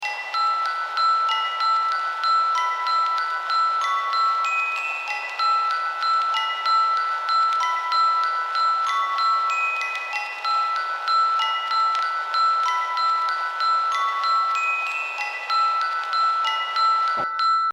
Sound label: vinyl; vinyl return; otoasobi